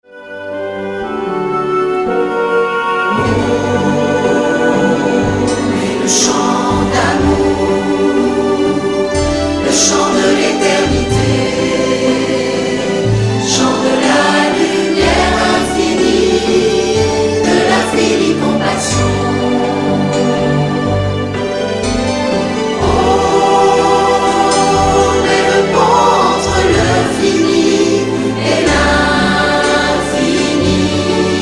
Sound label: Om Chant